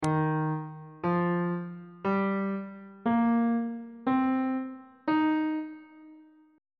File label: d sharp major pentatonic scale